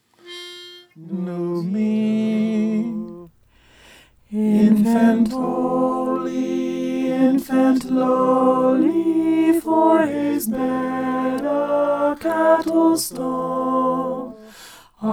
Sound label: alto